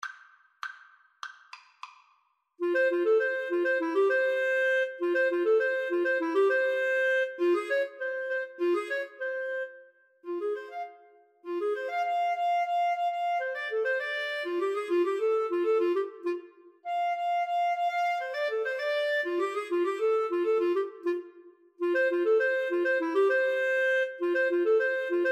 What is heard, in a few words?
Clarinet Duet